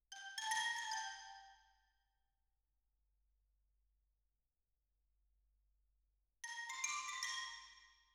Xylo

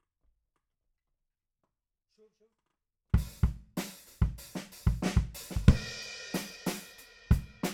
BD Out

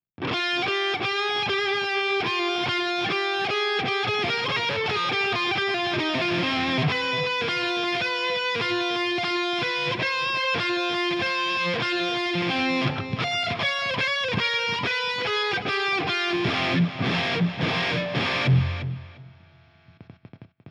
Guitar

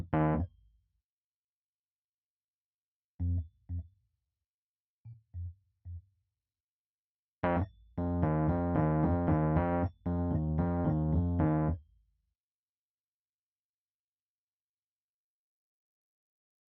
Keys

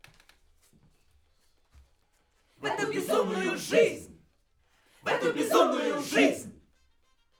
Backs SCHOEPS

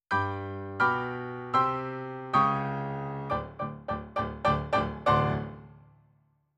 RnR Piano

